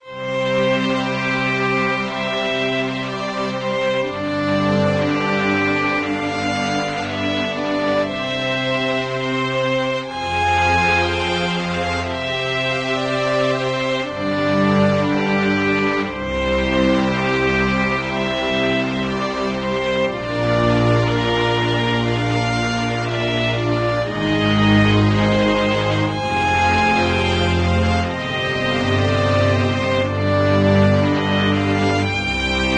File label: Quest Win; SuperOrxoBros HS18